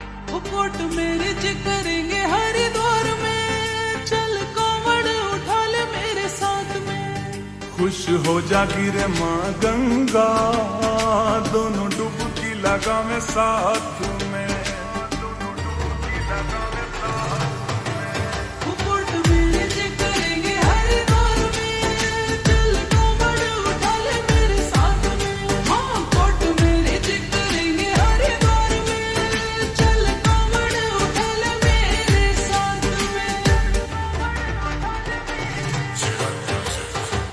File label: Slow Reverb Version Ringtone